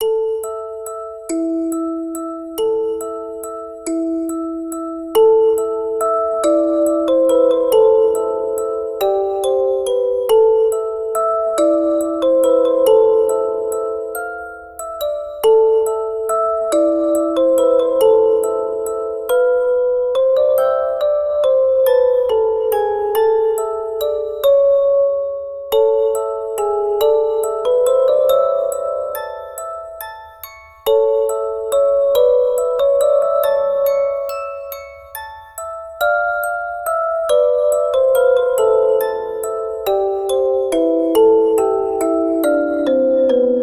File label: musicbox, 140